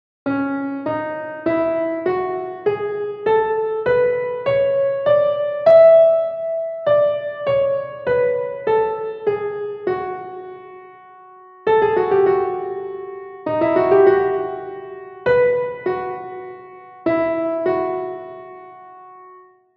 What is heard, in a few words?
harmoniya solfecio